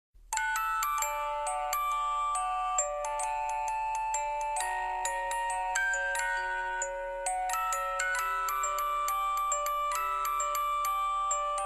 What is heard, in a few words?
Music Box Ringtone